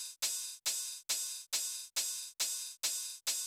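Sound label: open hi-hat, Techno 138 BPM; Best Service ProSamples vol.54; AKAI; FALLING HI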